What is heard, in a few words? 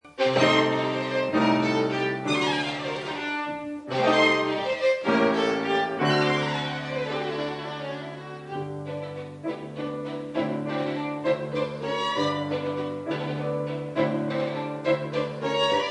violin; Concerto Homanaxe; Manuel Quiroga; piano